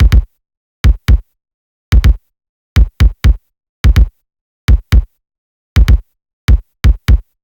Alternate Drum Loop 125 BPM Kick, Analog; Drum Loops; Cymatics - ANALOG EVOLUTION Beta

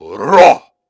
Grunts and Chants, Viking Vocal

